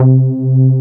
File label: SLAM C3; Best Service Alpha Dance vol.2; SLAM SEQ.1